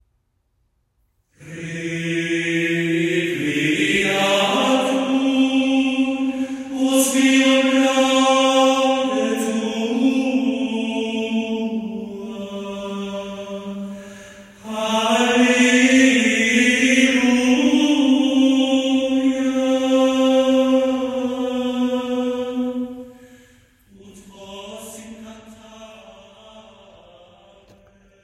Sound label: Plain Chant